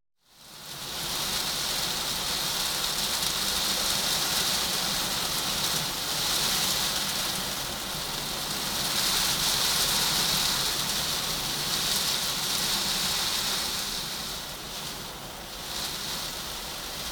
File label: Rain Against Window; The Elements